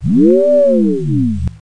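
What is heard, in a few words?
turbo lift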